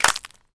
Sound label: clap f sharp key, Royality free clap single shot tuned to the F# note. Loudest frequency: 4398H; single hits one shots